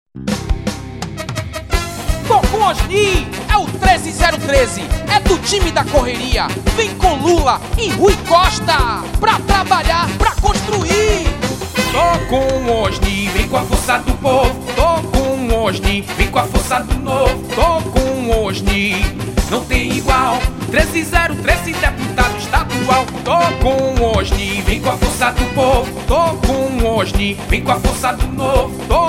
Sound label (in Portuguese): jingle axe